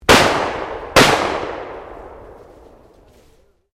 Shotgun 12 Gauge Double Barrel Firing 2 Shots; Sporting Shotguns

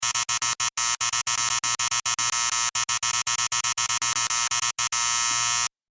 Beeps Data Transmission Technology

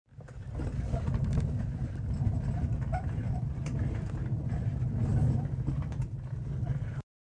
Janitor's cart rolls on hard surface.; Rolling Janitor's Cart; Cleaning; Offices

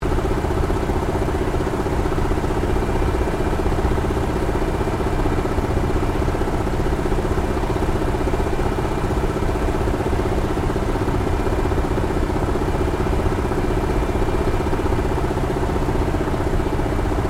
AIRCRAFT CAC WIRRAWAY FLYING INTERIOR STEREO; CA-16 Wirraway; Airplanes

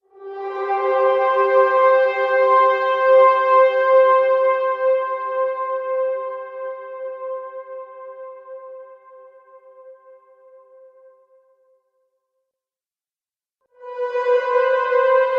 Cathedral Fanfare Collection; Musical; Musical Sound Effects

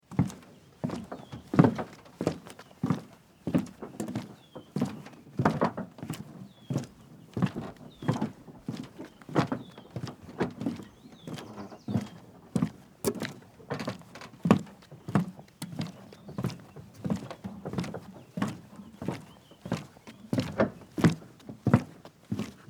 Footsteps Dock Walk Distant Birds; Human

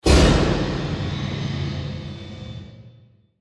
Hit To Whoosh; Hits; Sound Design; BlastWave

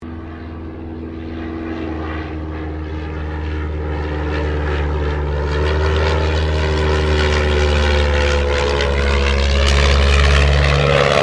AIRCRAFT DH82A DEHAVILAND TIGERMOTH PASS; RAAF Tigermoth; Airplane Sound Effects; Vehicles

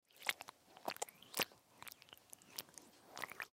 Gel Hair Juicy Movement Hands Slither; Primping; Human; Blastwave; Foley

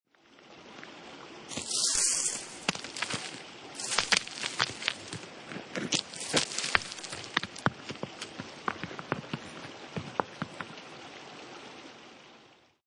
Leaves And Rocks Fall Into River; On a riverbank, rocks fall with leaves and twigs down towards the river; Debris

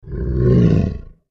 Monster Growl Deep Snarl; Beast Creature; Horror; Animal

